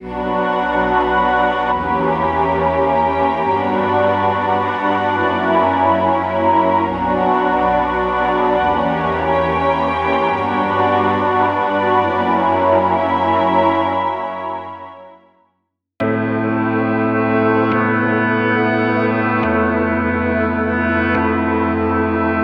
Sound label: UK Drill UK Grime